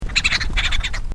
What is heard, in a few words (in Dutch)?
eksterbabbelaar